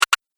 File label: Double Click Sound